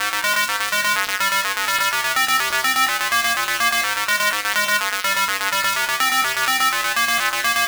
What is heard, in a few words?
Ab 125, Synth Loops 125bpm; Zero-G; Soundclash Nu Dance Electro; Acidized